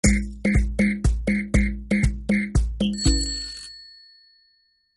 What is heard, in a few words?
victory; free rider hd